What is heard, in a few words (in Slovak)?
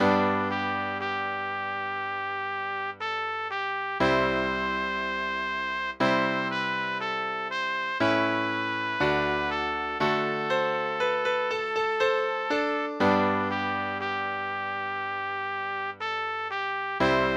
pre trúbku a klavír; Bola som na hubách